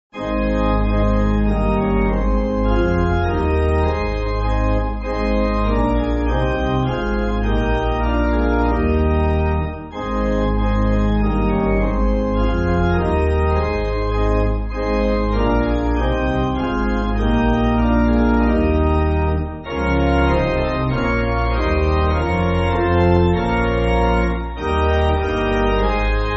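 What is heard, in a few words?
Pipe Organ, Music Backing Files for Churches; Manna Fall From Heaven; Silcher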